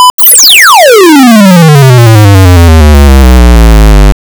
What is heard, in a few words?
Stimulus, Acoustics Test, Mic; Labview-Program